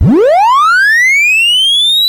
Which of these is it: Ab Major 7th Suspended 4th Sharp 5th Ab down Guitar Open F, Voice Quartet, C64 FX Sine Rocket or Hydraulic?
C64 FX Sine Rocket